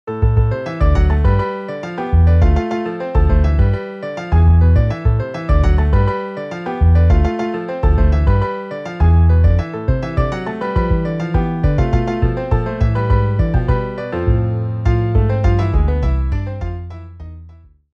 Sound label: Piano Bass MIDI